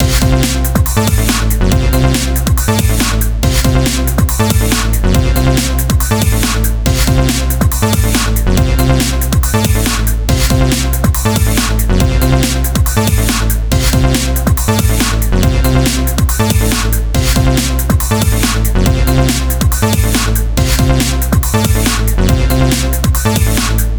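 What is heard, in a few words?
dnb bass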